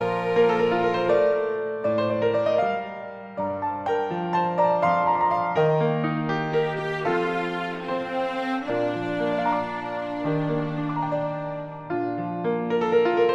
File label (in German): Instrumental-Intro